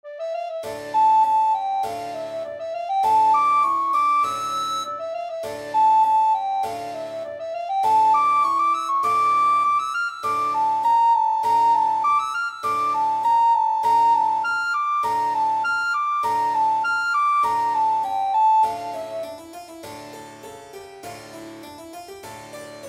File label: tambourin suite in Em no.8 recorder